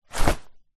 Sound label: jacket safety thick fluro hi vis drop floor; zapsplat; foley